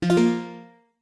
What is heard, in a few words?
Pause Menu Open